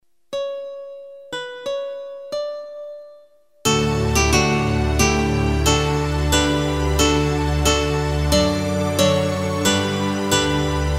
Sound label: instrumental; A Ti Que Morreste; Lion Of Judah; Hinário "Hinos"